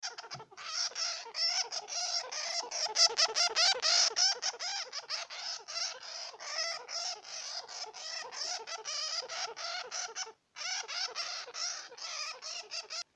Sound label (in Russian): homyak